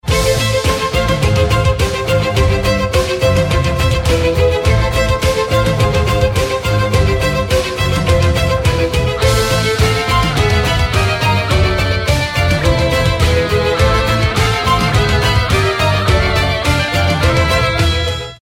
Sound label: Violin